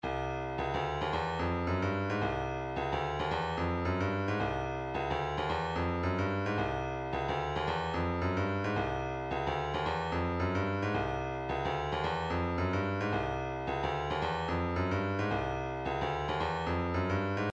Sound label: vocal planet deep breath, midi; Vocal Planet 110 Deep Breath2